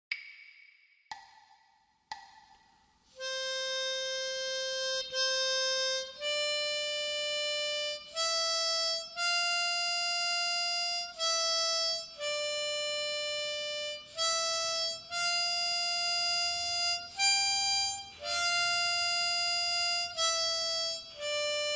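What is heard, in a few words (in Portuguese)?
gaita, exercícios de leitura à primeira vista; Gaita de Boca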